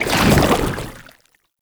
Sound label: Goo Layer, Footsteps; Enemies; Foley; Warframe; Lasrian Soldiers; Lasrian Chem Strike Nox